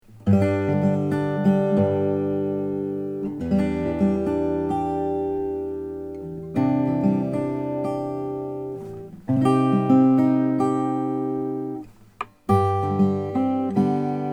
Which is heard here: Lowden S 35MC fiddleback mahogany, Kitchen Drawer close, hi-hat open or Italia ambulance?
Lowden S 35MC fiddleback mahogany